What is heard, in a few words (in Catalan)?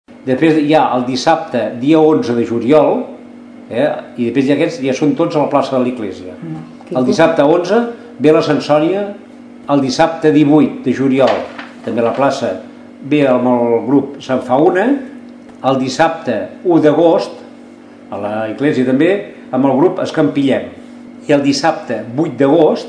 ball folk, ball folk tradicional; L’Associació La Nostra Dansa; Ràdio Tordera